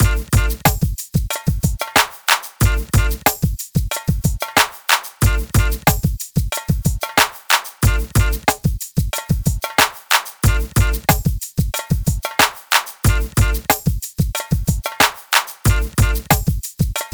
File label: E-Coast HipHop Combo; Digidesign; Pro Tools Essential Loops